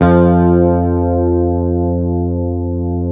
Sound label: Hamer 12, SUS, F2, Bass; Sampleheads; Will Lee Bass Library